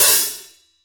Hats Real Open

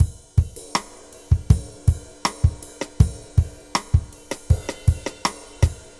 Drum loops, Boombap

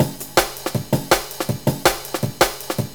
Drum loops, Funky

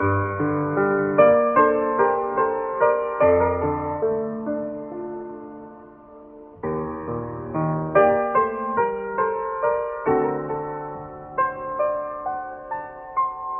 piano, sad

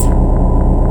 bomb machine room, rumbles

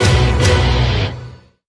tada metal

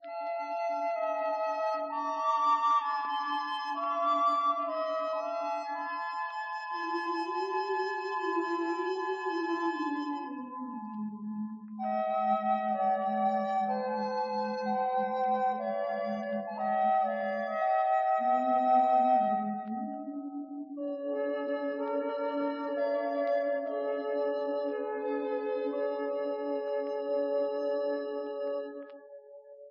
flute in sadness

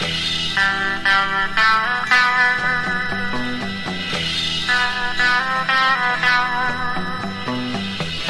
guitar, Loops

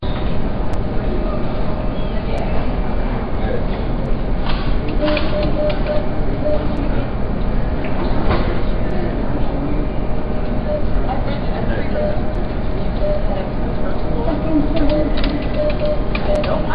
hospital

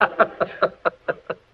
laugh

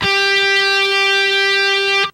stroke, Guitar; Notes

